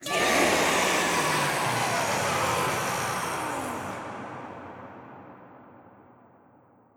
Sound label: Horde